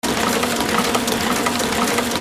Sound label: INDUSTRY